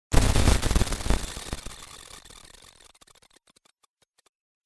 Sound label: ROBOT BREAKING DOWN